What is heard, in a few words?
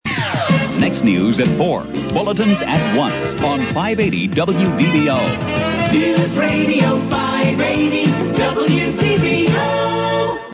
news promo sounder